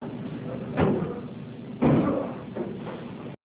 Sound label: legdrop